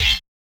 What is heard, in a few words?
Yamaha DJX 2B, Hit